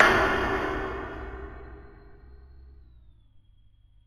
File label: Percussive Stab